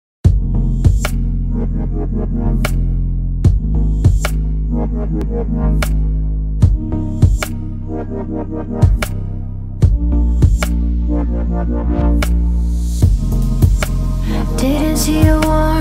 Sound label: english wave music mobile ringtone song